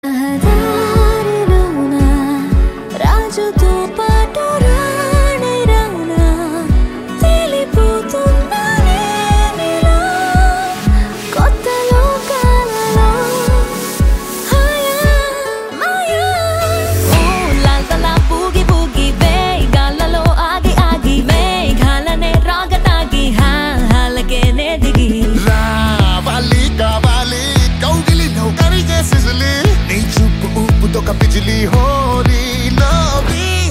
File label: TELUGU RINGTONE